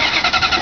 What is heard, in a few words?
starter